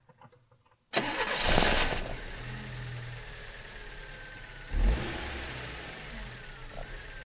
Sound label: Car Ignition And Rumble